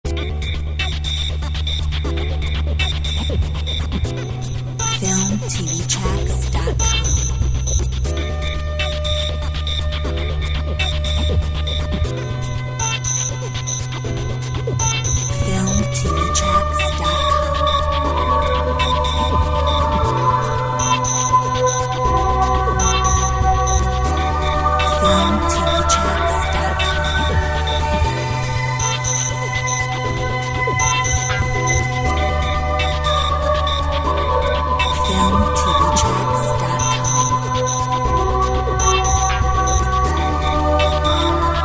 Asian rain

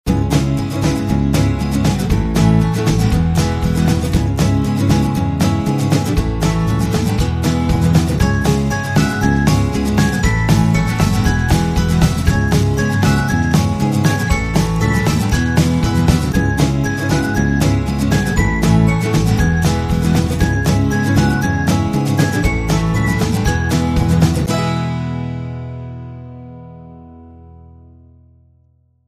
Upbeat acoustic folk

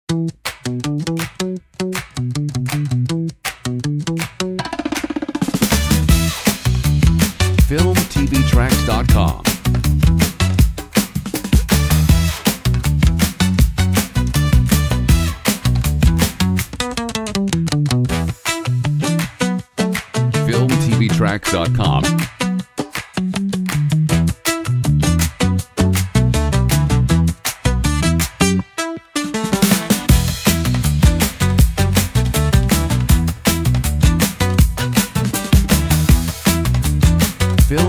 BOSSA HIP HOP; comedy funny

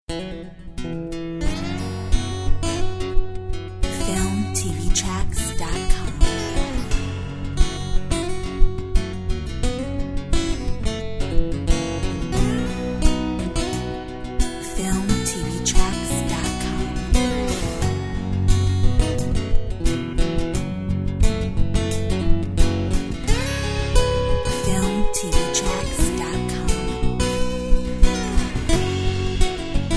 Southern blues

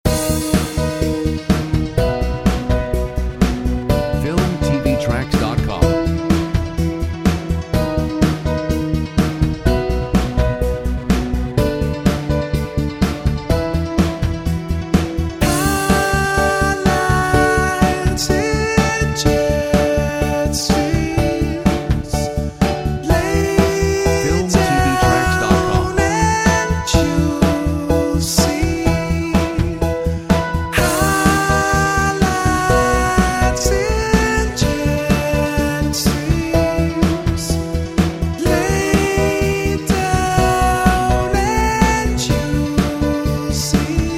Jetstreams vocal

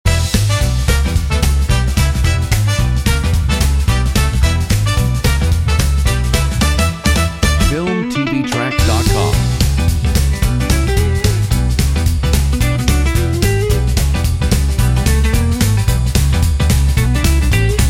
Funky

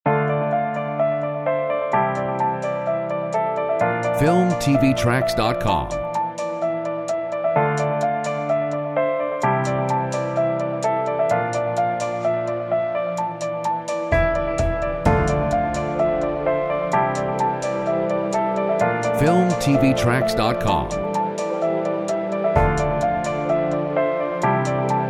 Orch drums

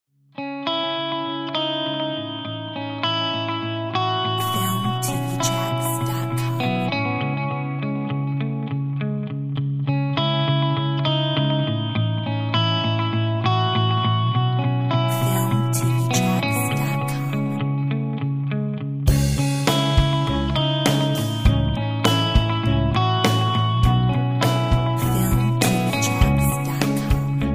Cinematic

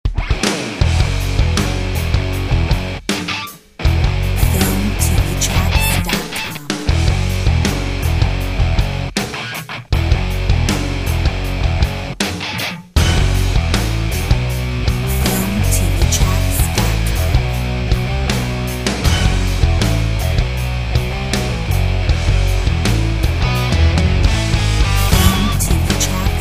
Rock fuel

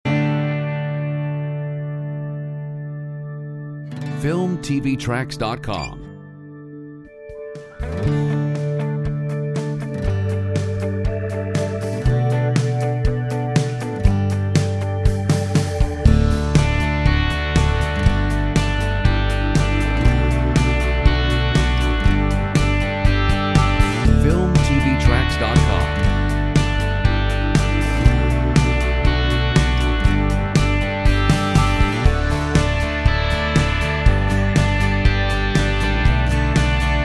drums no vocal; Stargate

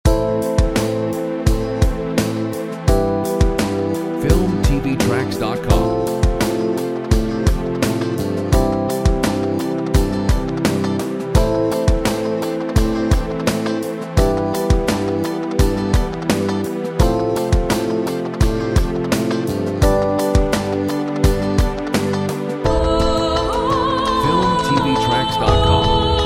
Neo opera voice

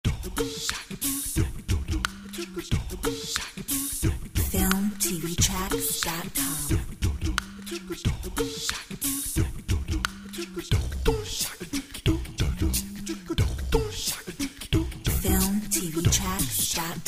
Human Beatbox

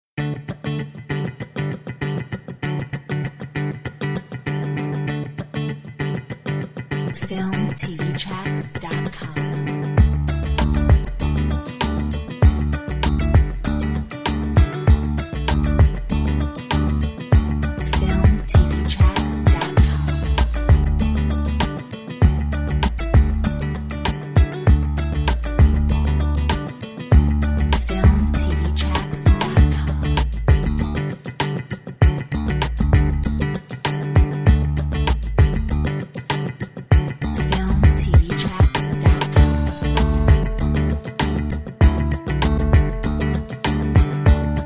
funk

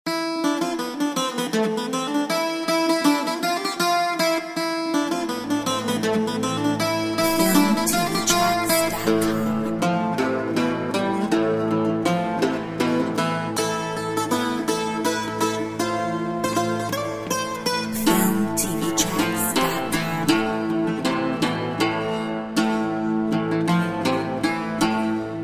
The Irish Bouzouki

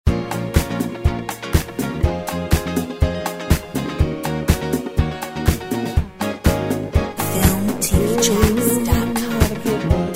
Disco chic

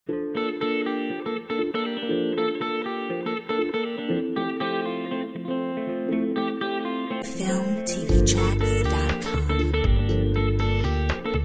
Bossa

